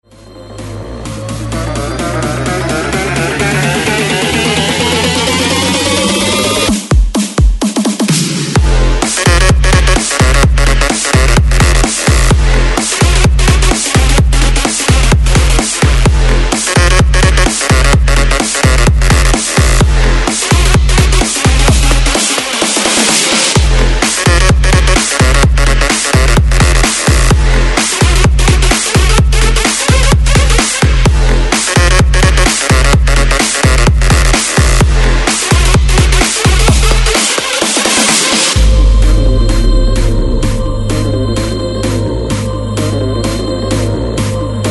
130 BREAKMIX; DJDOLLS

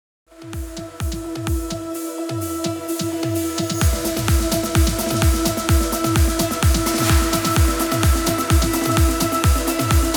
Progressive Cut 128 BPM Clean

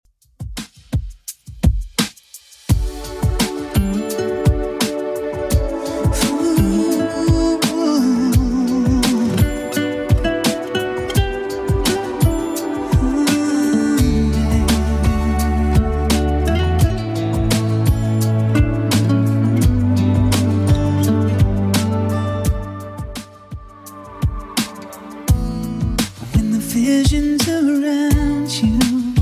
2Ks Redrum 86bpm

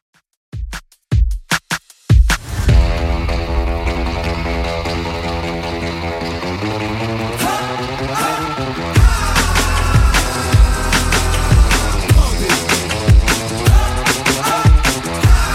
2ks Redrum 113bpm DIRTY

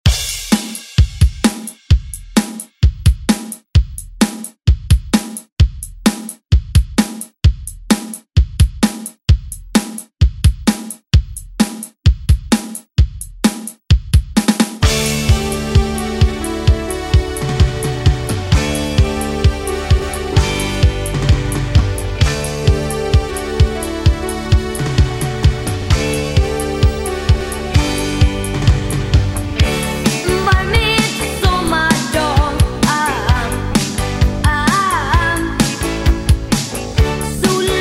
80s ReDrum; DJ Mhark

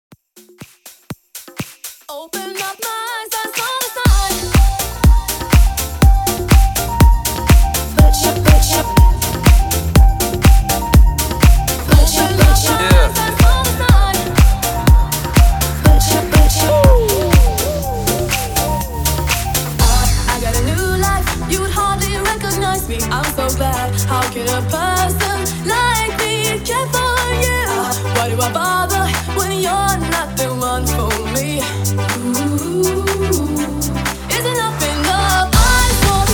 HOUSE MIX 122 BPM

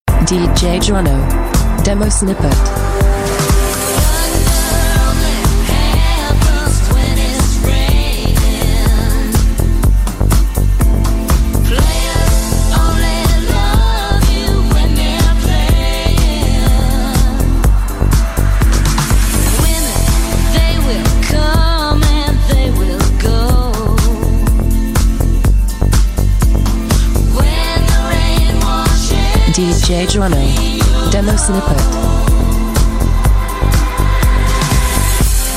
Deep House

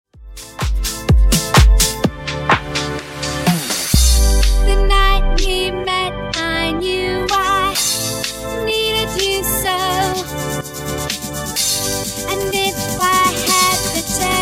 Funk 126 bpm

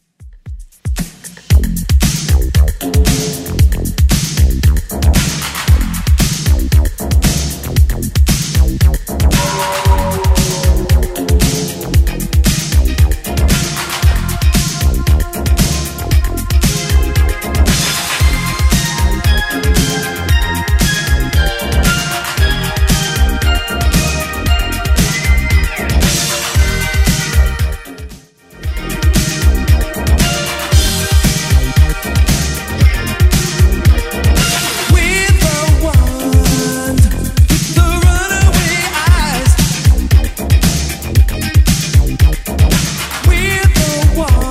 80s 115bpm; Redrum

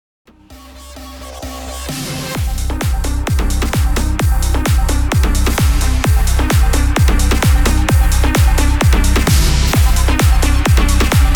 130 BPM

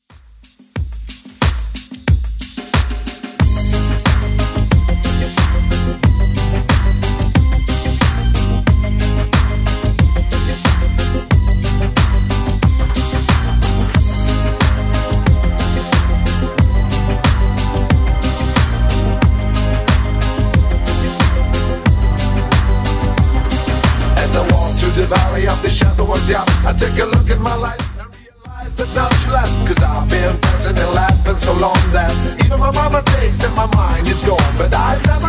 90s Redrum 91bpm

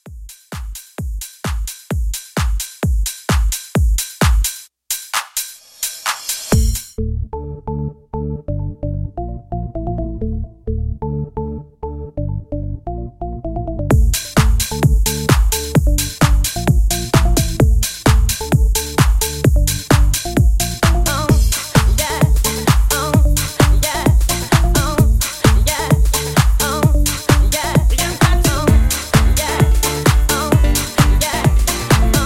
90s House Re-Drum 130

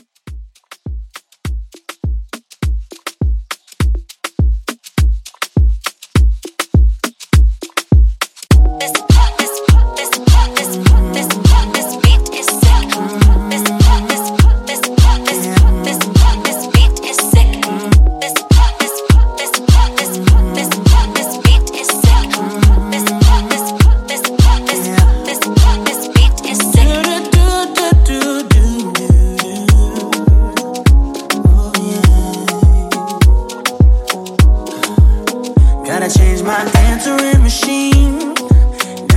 Afro Mixshow 102

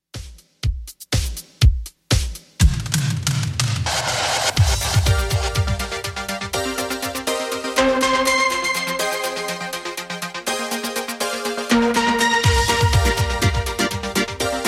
80s Dancemix Redrum 122bpm

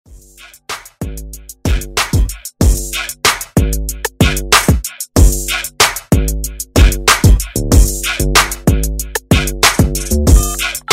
Redrum

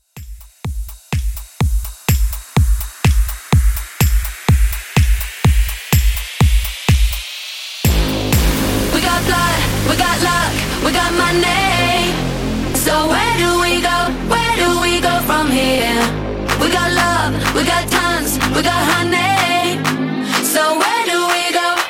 Club Extended Mix 125Bpm, Remix

